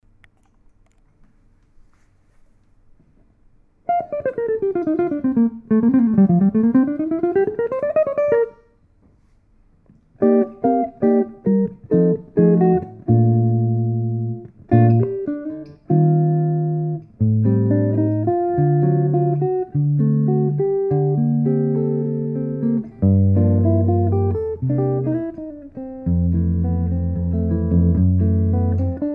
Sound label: 16" Carved Spruce Top / Laminate Back Archtop; Ori Ventura Playing His 16" Carved Spruce Top / Laminate Back Archtop